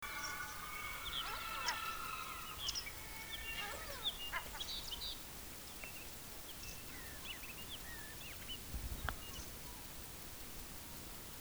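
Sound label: Monticola saxatilis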